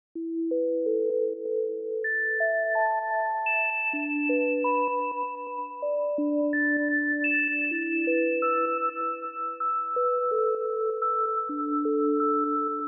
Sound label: sine